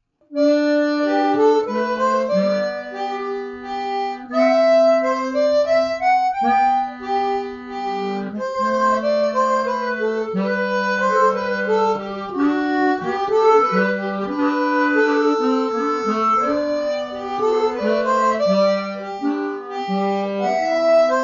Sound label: Minuet G Bach, harmonica; Suzuki Chromatic SCX-48; Echo Effect by Adobe Audition 2.0